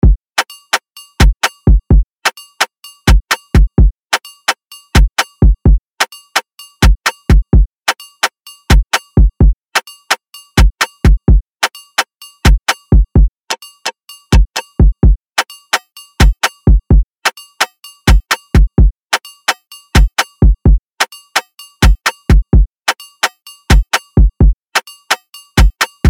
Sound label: Latin Melody Loop Dmin 128 BPM Drums